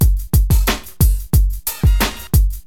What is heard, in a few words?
DM LOOP, HipHop, Drums; LiveAct Pool